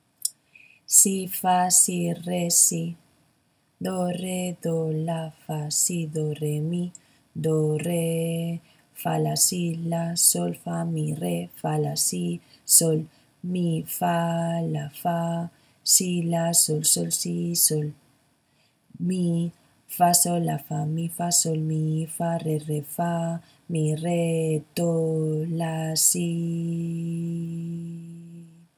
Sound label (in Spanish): ritmo 6-4